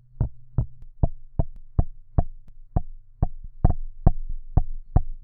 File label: pull up with water, Cup drum; russia 2011